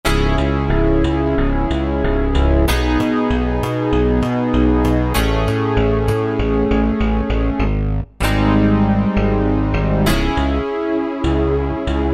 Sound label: Nasty Harmonics, Korg Wavestation A-D